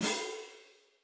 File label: sfx notice